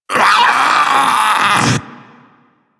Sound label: Infested Splitter Split Top Vocal Alerted; Warframe; Grey Strain